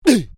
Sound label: MALE VOCALIZATION UGH; Exclamations; Voice